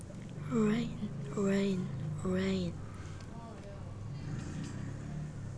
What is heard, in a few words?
rain